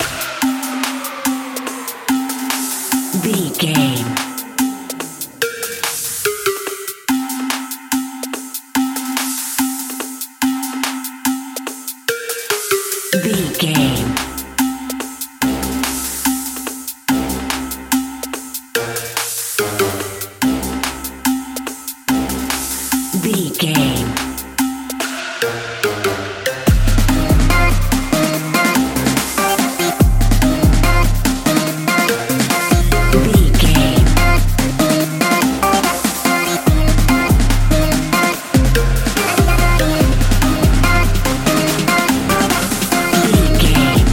high speed vessel party

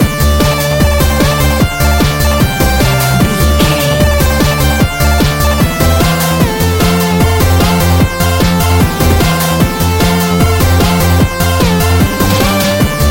retro 8 bit game stage loop

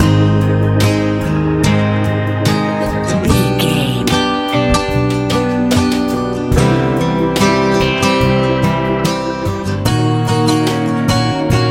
pop rock

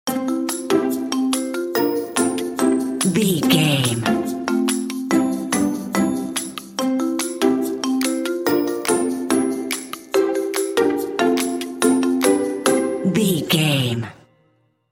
hap hap clappy

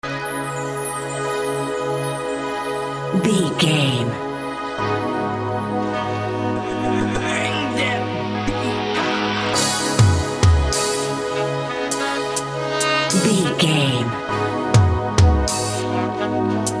alone interlude ft sax

